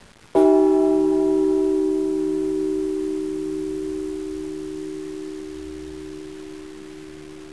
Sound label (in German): clausthal mittlere, Glocke 2 Bronze; Marienkirche